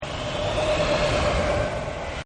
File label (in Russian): veter shumnii blizkii myagkii svistyashii